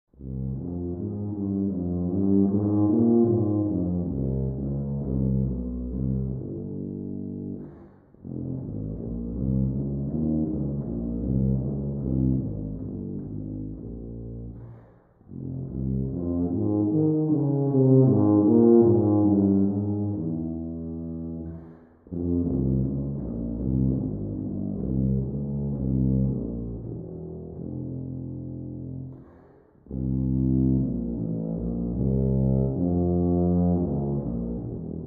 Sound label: Tuba Etude; Snedecor